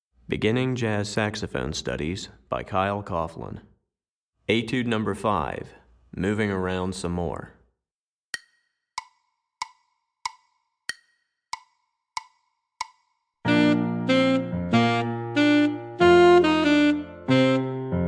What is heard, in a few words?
Alto Etude, Saxophone